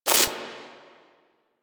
Screen Glitch; Warframe; Mini Games; Caliber Chicks Minigame

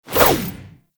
Jump Whoosh

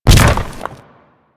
Colossus Hand C; Warframe; Colossus Boss

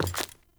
Wood Step; Characters; Ambient; Steps; Sprout Farmers

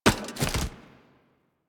Push Soldiers; Vor; Warframe; Tutorial

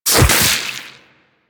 Gore Spew; Enemies; Warframe; Infested; Grey Strain; Splitter

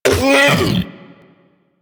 Predasite Vocal Pain; Warframe; Hunting Critters

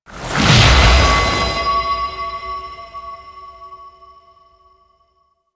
Empowered Geode Appear; Warframe; Citrine

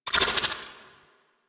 Sniper Zoom In; Warframe; Tenno

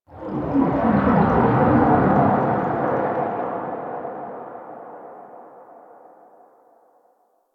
Space Waves; Ambience; Exterior; Warframe; Railjack

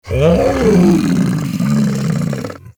Infested Femur Vocal Charge; Warframe

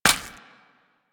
Step Default, footsteps; Warframe; Grineer Royal Jester; Foley

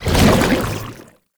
Goo Layer, Footsteps; Enemies; Foley; Warframe; Lasrian Soldiers; Lasrian Chem Strike Nox

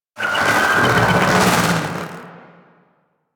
Vocal Death; Warframe; Sentients; Conculyst

